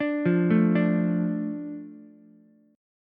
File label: A Suspended 4th Sharp 5th, Ukulele; Asus4#5; arpeggiated; JGuitar